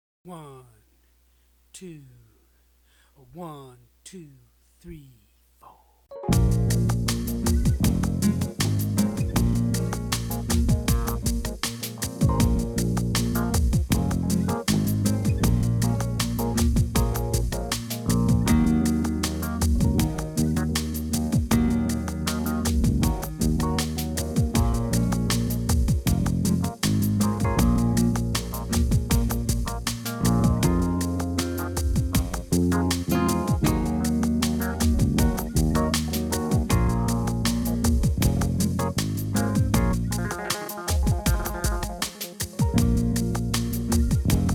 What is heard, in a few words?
pentatonic minor tracks in Bb